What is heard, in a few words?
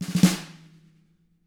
snare ruff; ILIO; Double Platinum Drums 1